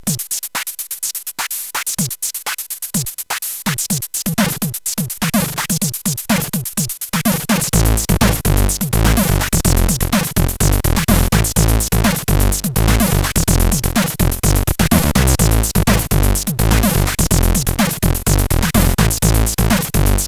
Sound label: Funk, sid CSG8580R5